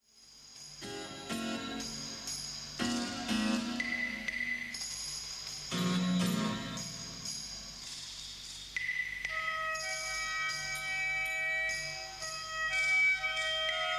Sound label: Vox